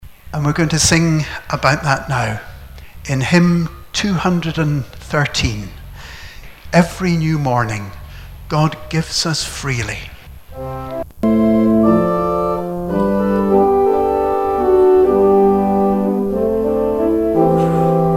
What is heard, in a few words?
hymn; Hymn 213 - Every new morning